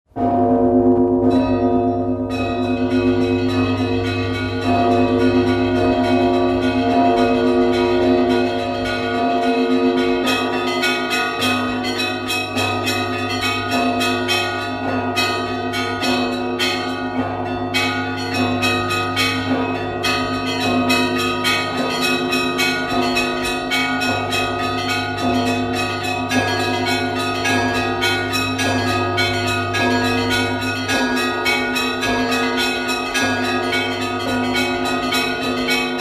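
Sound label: Kolokol trezvon, bell peal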